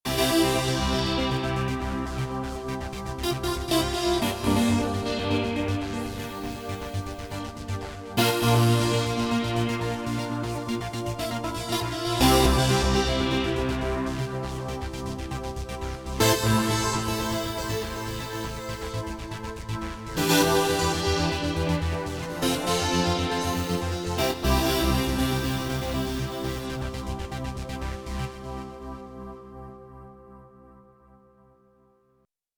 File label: Chord Pressur, Korg Radias-R